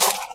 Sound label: gravel footstep